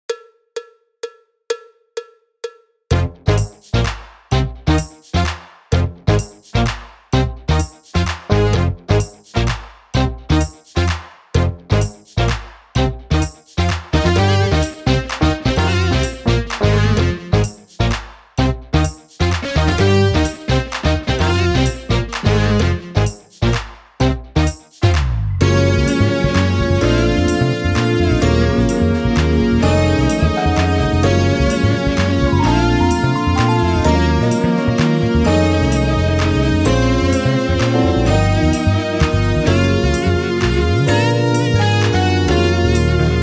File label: Chilled Fusion drumless